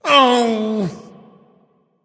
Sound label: "ugh"; smite; voicepack; ullr